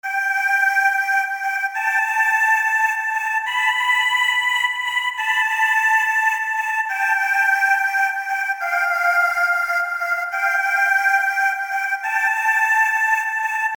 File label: violin and chior loop